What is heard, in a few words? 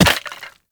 Operator Run Bone, Footsteps; Warframe